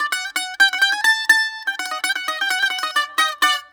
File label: BAGLAM D, Mediteranean